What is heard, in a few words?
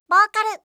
vocal